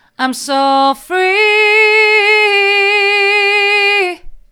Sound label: mid, vocal